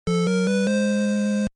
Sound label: get item